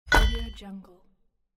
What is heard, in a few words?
blacksmith impact